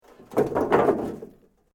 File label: Metal Sheet Move; Materials